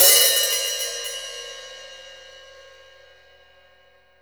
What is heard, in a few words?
hh open, Percussion; realdrum